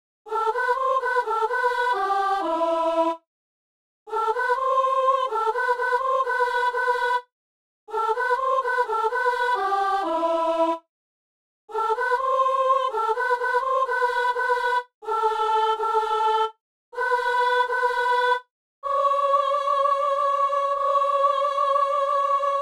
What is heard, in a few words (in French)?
gloria, soprano; Messe de Saint-François-Xavier - Gloria